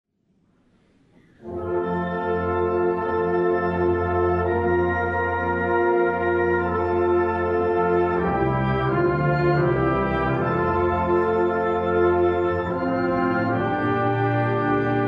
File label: Choral Zugabe